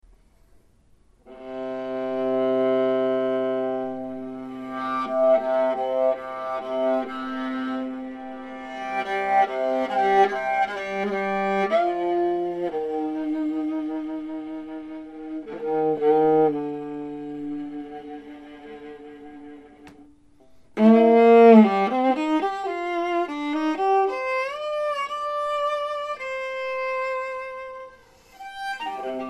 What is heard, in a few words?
Violaquinton